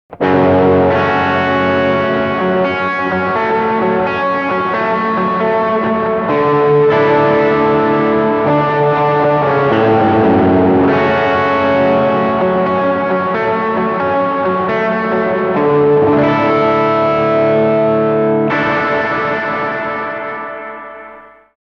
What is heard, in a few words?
Reverb Overdrive